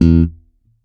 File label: Bass Thump E, Electric Bass One Shots; Slate Digital BASSHOLE Sample Pack